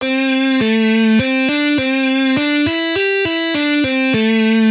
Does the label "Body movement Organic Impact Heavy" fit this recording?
No